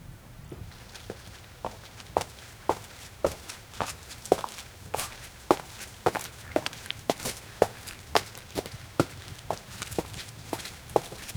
sounds of skeleton walkin

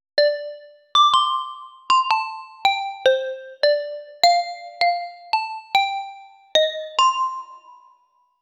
melodic sounds for a

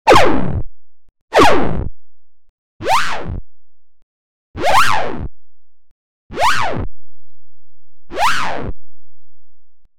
8 bit sound of a; mario

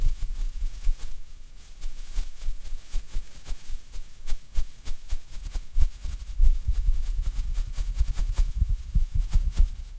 a flower that flaps, flora

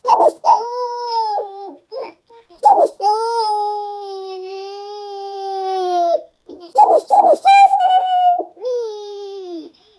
sound prompt 1 sec, thwack

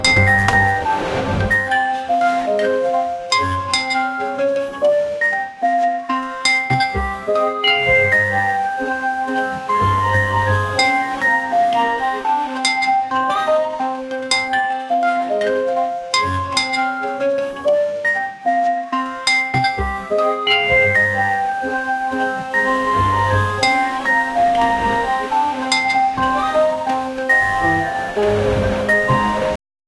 casual vibe for a superma

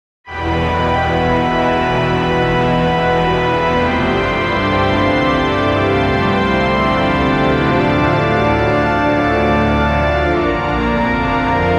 dark gothic orchestral th

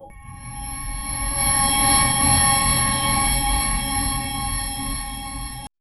rising arcane circle, circle

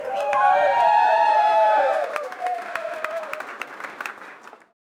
crowd cheering happily; events

